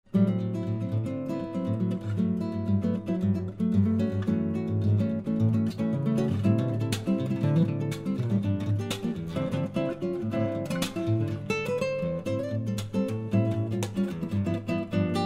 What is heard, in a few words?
Improvised Spanish Brazilian Guitar; Flamenco Brazilian Classical Guitarist